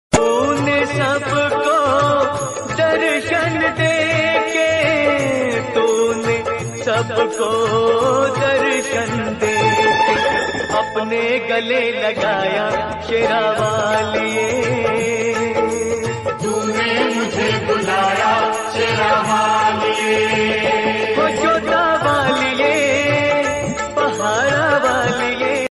bhakti song ringtone; sherawali; jay ambe ma

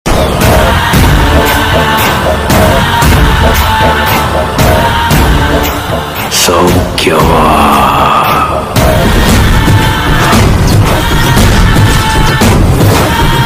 Glimpse BGM Ringtone; kanguva

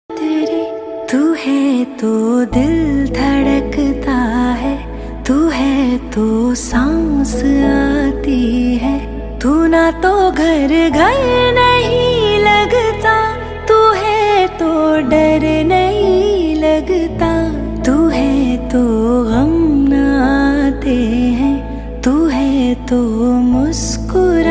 song female version

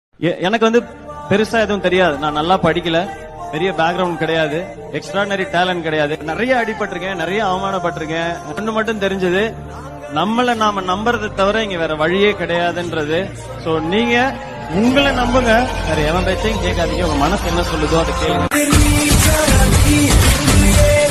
motivational bgm ringtone; ethirneechal

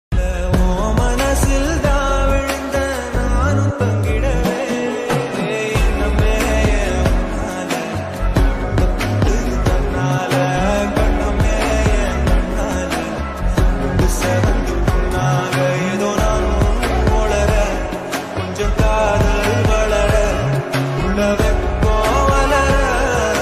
tamil love ringtone

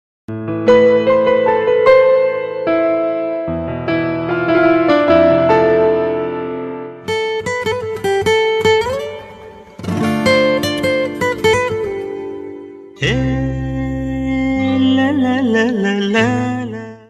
kannada ringtone; geetha movie; shankar nag

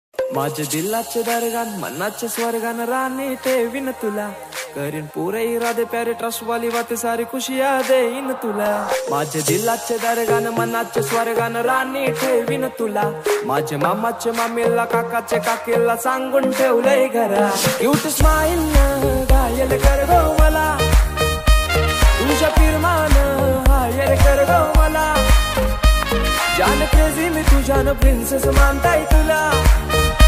new marathi song ringtone marathi ringtone

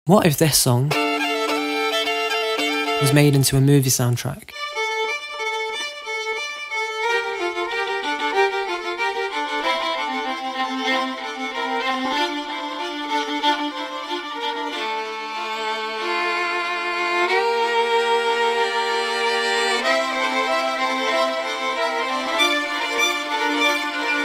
dramatic violin version

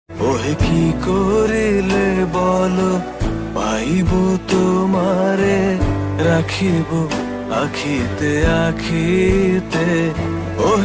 rabindrasangeet bengali ringtone bangla ringtone

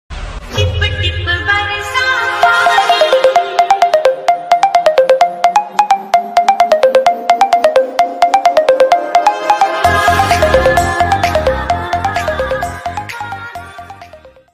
remix dj ringtone love bgm

